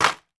food box of herbal sweets drop on hard surface

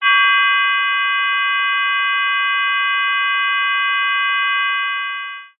technology cb radio interference burst receiver; zapsplat

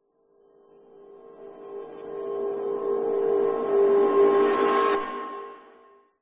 horror riser metal reversed rattle

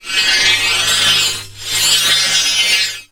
foley metal bar heavy long drag concrete; zapsplat

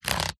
leisure game score slider move slide

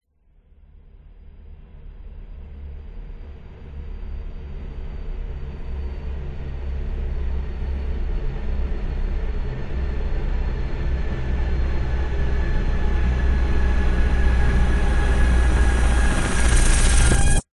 horror riser slow build weird dark

